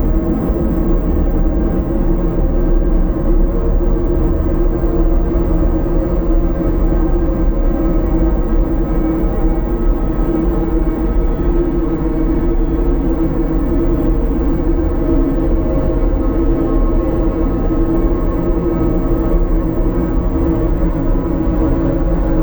warbling

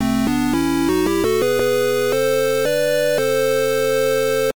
fanfare

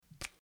slime hit

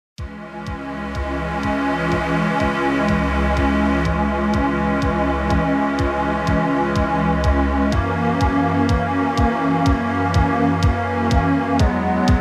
Retroracing Menu

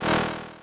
saw

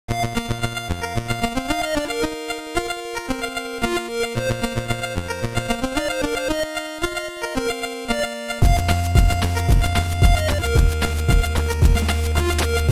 Happy Levels, Forest

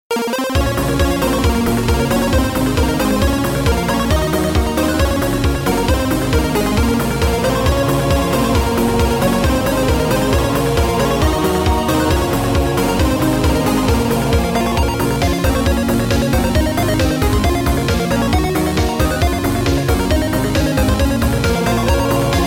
BGM Menu

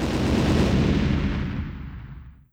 boss death

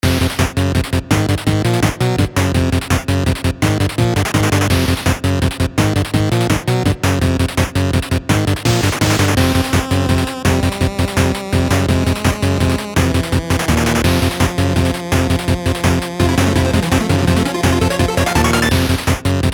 8-bit crisis

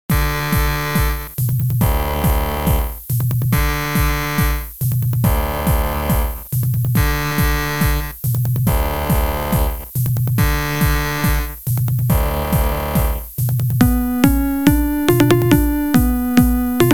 Factory; Ground Floor, Please!

